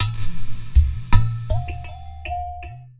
Udu

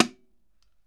can hit; AKAI S-Series CD-ROM Sound Library VOL-6

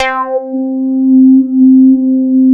MONSTA C5, bass; Q Up Arts; Dream Experience

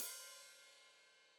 Hat Foot Open, Hi-Hat; Deeply Sampled Drums

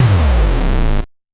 Noise A, 8-bit, Bitty Noises

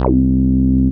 BAS, Eurotech; Sounds Good

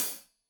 Hat Loose, Hi-Hat, Cymbals Stereo

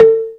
ZYLIMBA; Greytsounds VOL-1

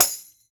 Tambourine hard; Big Fish Audio - DOM; Redrum Drum Kits

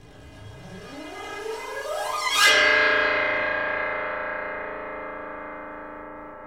PIANO, Extraterrest; Optical Media International; Sonic Images Library; SI FX Vol 16

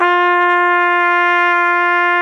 cornet F4; AKAI S-Series CD-ROM Sound Library VOL-2

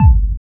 DR. LOOP, DRUMS; X-Static Goldmine 3

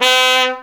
cornet lips B3; AKAI S-Series CD-ROM Sound Library VOL-2

